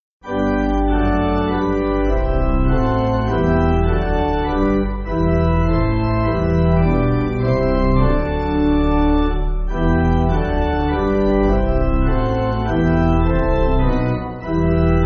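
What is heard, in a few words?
Pipe Organ, church backing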